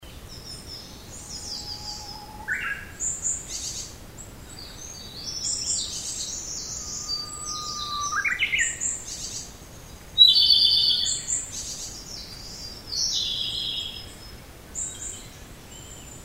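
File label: komadori, Japanese robin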